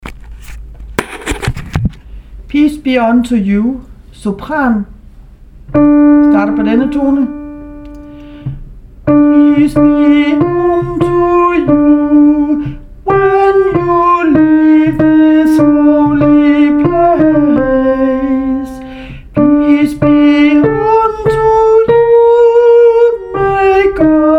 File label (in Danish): sopran; peace be onto you